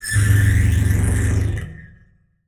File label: Transition Electrical Warble; Adobe; Production Element